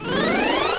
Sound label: Enter Category; Let's Discover Michigan!